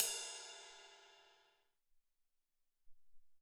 20 Ride

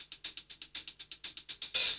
120 HIHAT

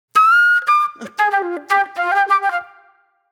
Flute, Disco; Sonic Foundry (Sony Creative Software); Manhattan Flutes; Session 04 - Cruisin' Disco

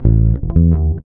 REAL BASS, BASSLICKS; Ueberschall; Jam Box

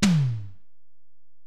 Tom, Alesis DM-PRO

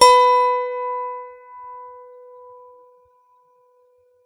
lap harp pick B5; Ethnic

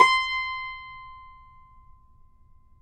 CUMBUS C, Guitars

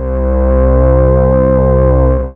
STRANGE, SYNTH FUNK; Zero-G; Funky Elements

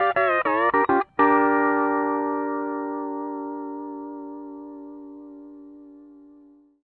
105 L, PD LOOPS

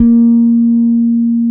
FEND P-BASS BF3

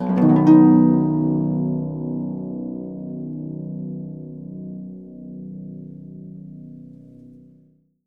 Percussion & Harp; Miroslav Vitous

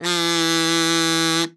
KAZOF F3, KAZOO; Xsample VOL-9

